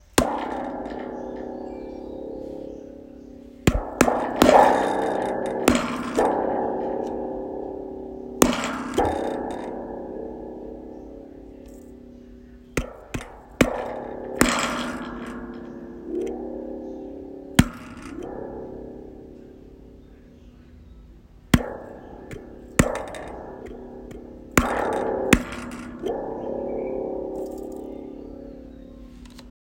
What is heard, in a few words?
Wire spring